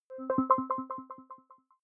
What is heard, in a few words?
button echo resonance arpeggio; signale